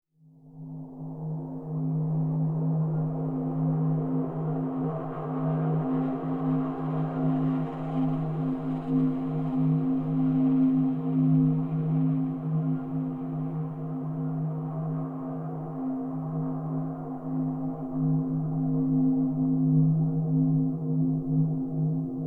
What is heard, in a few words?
music main